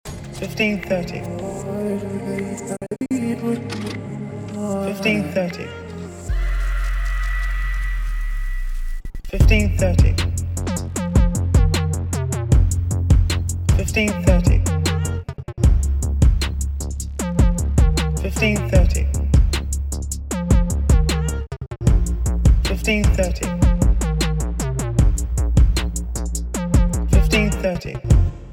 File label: Grinding